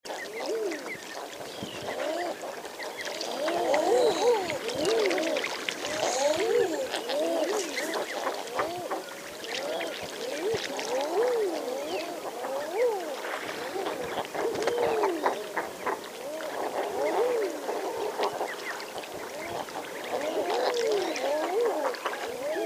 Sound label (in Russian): obyknovennaya gaga golos